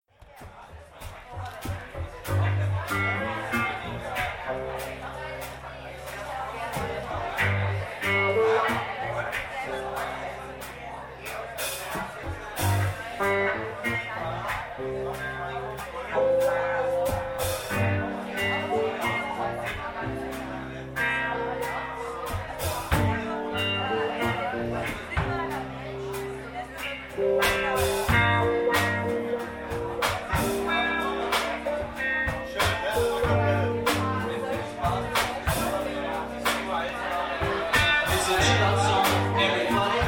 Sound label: chill out song